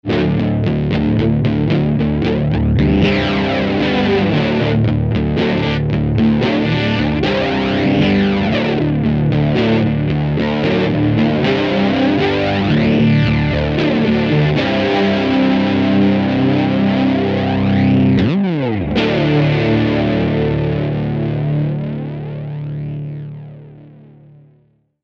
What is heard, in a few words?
low d, Alt Tunings